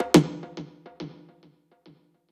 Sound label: percussion oneshot next; Pop; Drums; SD PASADENA Pop Sample Pack; Slate